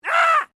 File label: MALE SCREAM MID; Male Vocal sounds; Screaming (Sound Effects:Voice)